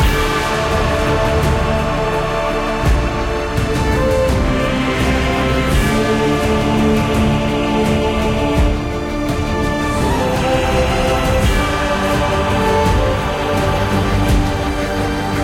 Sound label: flame loop